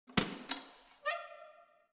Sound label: letterbox metal unlock open pull hard